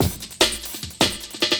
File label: LOOP, 150 BPM, Drum & Bass